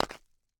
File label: Medium Rock Dropping; Sonic Bat